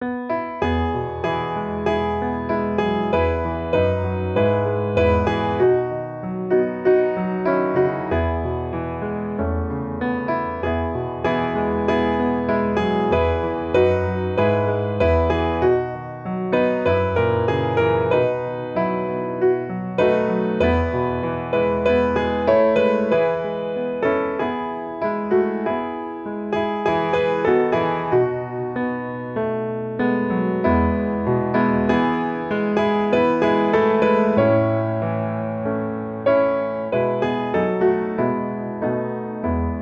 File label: piano